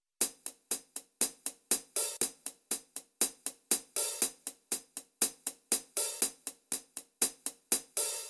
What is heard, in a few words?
drums hihat